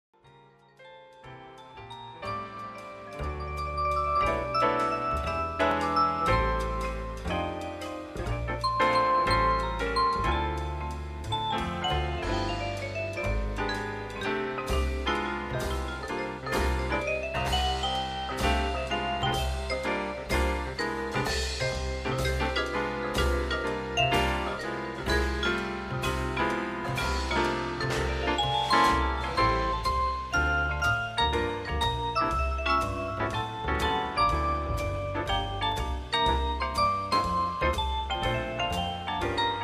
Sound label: JAZZ COMBO